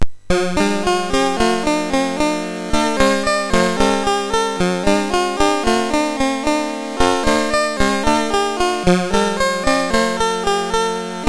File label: MOS6581R3, GAMES; Willow Pattern; HVSC